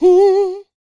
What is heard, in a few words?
D BIT, Vocal R&B Dance